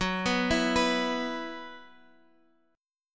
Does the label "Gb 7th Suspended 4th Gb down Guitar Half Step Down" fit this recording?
Yes